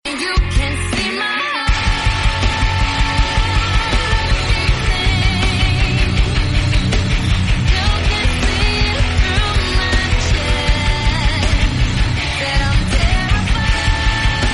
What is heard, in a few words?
rock ringtone